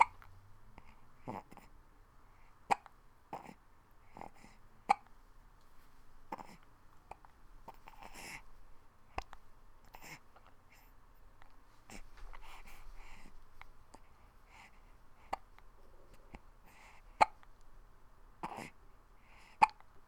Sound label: Hiccup Baby